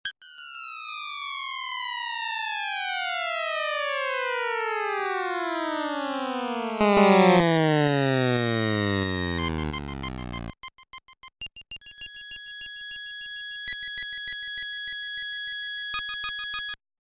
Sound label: Ship Departing; nes; Cosmo Police Galivan